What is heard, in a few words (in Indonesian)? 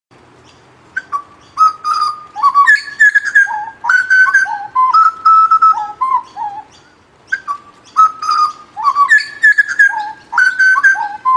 jagal papua kicau